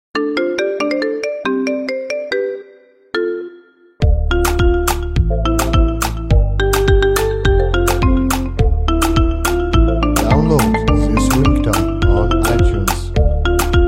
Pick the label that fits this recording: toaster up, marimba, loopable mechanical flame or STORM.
marimba